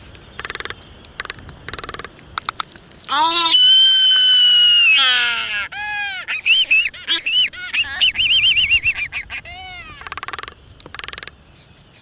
albatros chernobrovyi

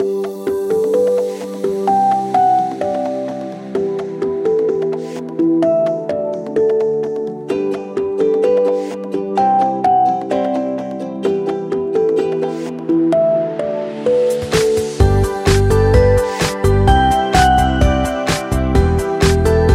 muzyka dlya igr

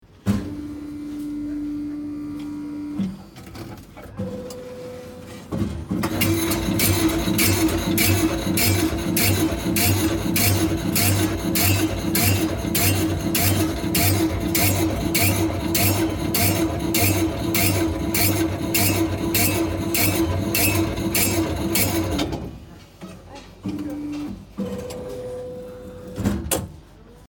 xleborezak, hleborezki

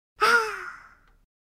zvuk mult udivleniya

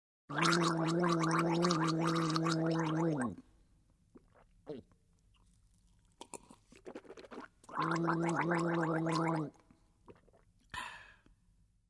Poloskanie gorla

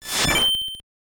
logo zajglos

